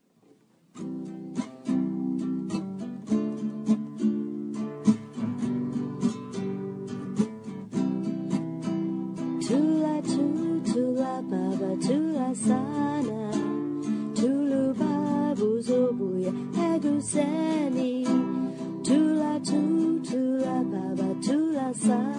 voice acoustic

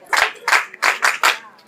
lets go clapping

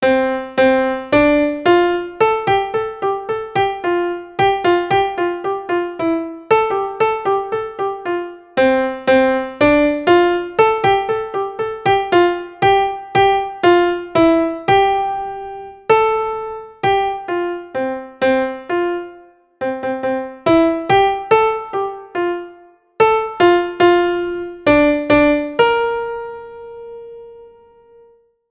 gobs and gobs instrumental

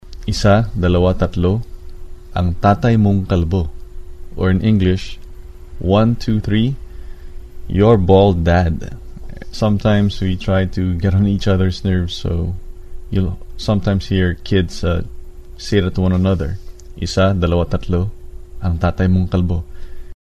count chant

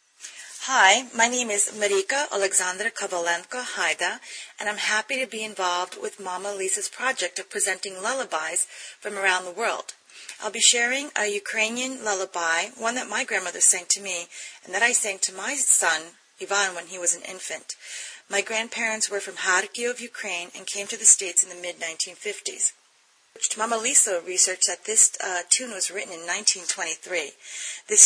ukrainian lullaby with comments

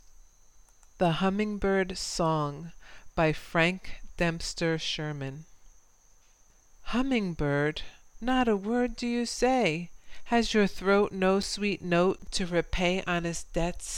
the humming bird song

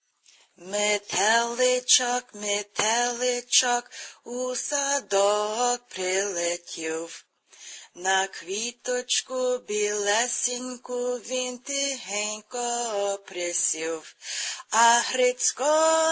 ukrainian lullaby

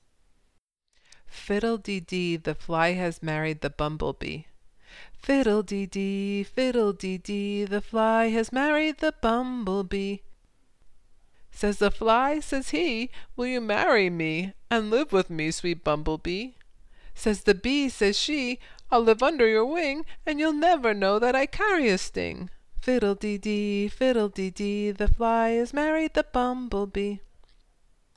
fiddle de dee half sung

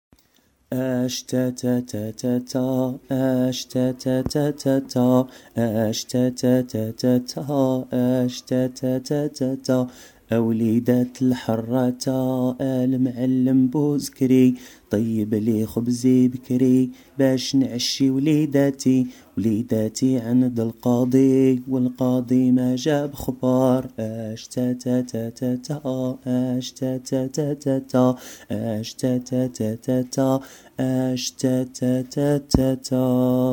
oh rain moroccan song

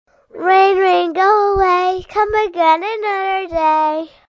rain

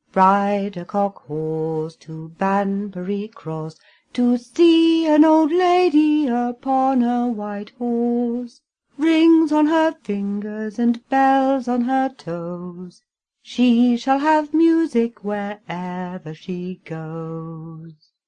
ride a cockhorse

